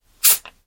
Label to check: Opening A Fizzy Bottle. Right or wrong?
Right